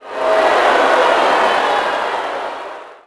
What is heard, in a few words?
NEG Reaction,Crowd; Cars; XBOX